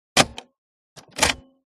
Automobile; Radio / Cassette; Car Radio / Cassette. Cassette In, Then Ejected.